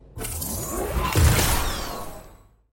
fusion rifle; omolon

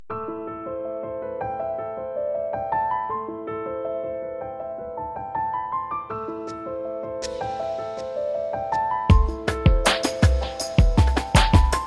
Grand Piano

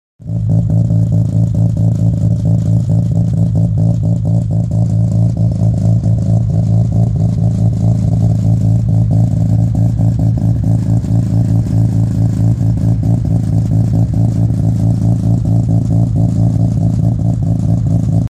Mazda Rotary Idle

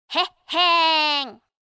victory laugh; rikku

